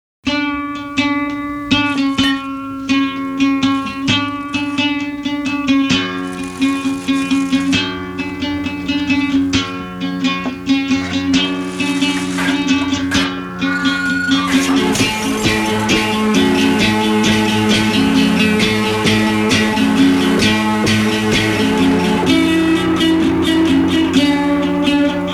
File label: Acoustic Guitar with Razor Blade